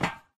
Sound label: Default Metal; Doodle Jump 2; Android